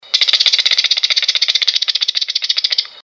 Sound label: Chkt Chkt